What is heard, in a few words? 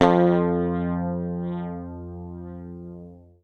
PICK, Bass Library